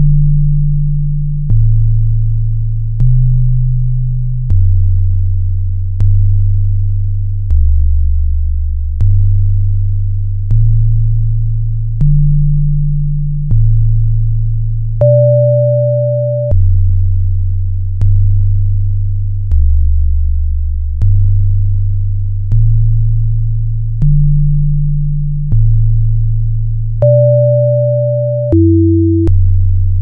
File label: pachelbel